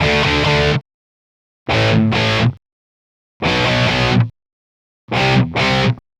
140 Pop Guitar 8th D maj, Electric; Pop Rock; Electric 100-160 bpm; Pro Tools Essential Loops; Digidesign

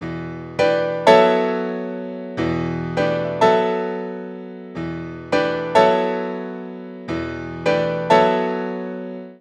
NuJazz Piano E; Digidesign; Pro Tools Essential Demos; Essential Loop Demos; Demo Session - Jazz

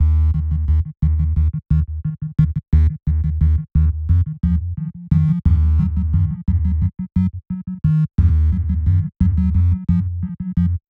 HipHop Synthbass D#m; Digidesign; Pro Tools; Pro Tools Essential Demos; Essential Loop Demos; Demo Session - Hip Hop

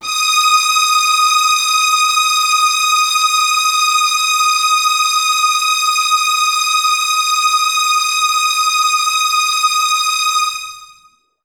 Pop Hi Strings Ebm; Pop Rock; Pro Tools Essential Demos; Digidesign; Essential Loop Demos; Demo Session - Pop Rock

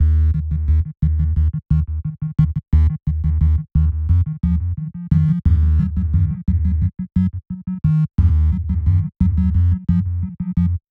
Hip Hop Synthbass D#m; Digidesign; Pro Tools; Pro Tools Essential Demos; Essential Loop Demos; Demo Session - Hip Hop

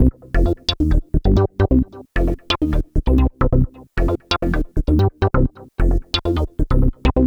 132 House Arp Cmaj; Dance DJ; Synth; Arps; Pro Tools Essential Loops; Digidesign

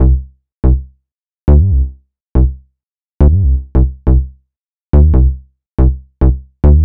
140 House Bass Cmin; Dance DJ; Digidesign; Pro Tools; Pro Tools Essential Loops

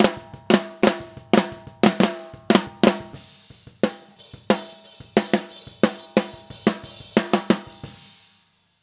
Snare; Pro Tools Essential Demos

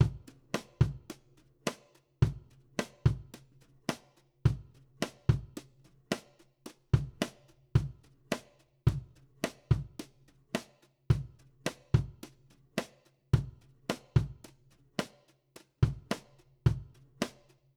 Country Drums (Verse 1 Alt); Digidesign; Pro Tools Essential Loops

